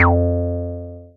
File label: Synths BASS